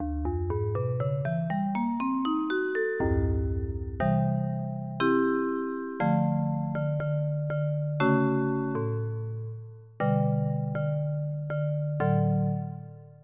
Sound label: E Piano 2; EverQuest